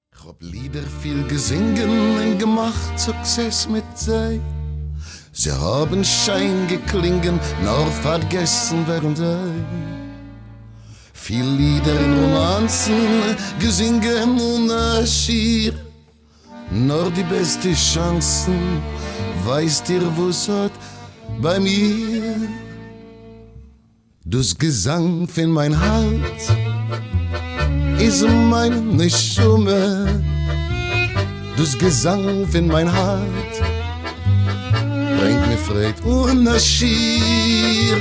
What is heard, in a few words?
Yiddish Tango